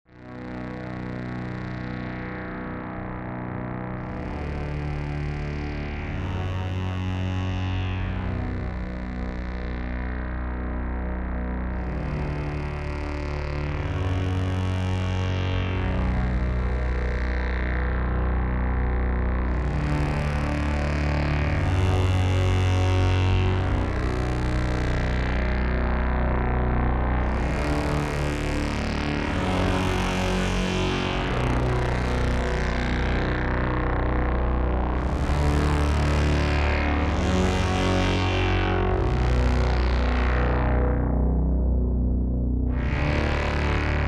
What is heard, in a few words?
Dirty Drive; Tracktion Abyss